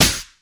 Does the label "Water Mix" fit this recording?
No